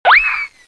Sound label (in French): Toupie, Cartoon; bruitage